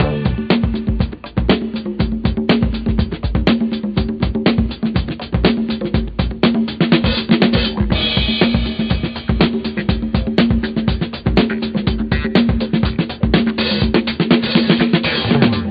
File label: 120 bpm, drum loops and breaks